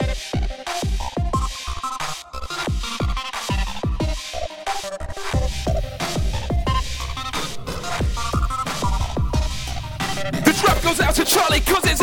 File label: song happy birthday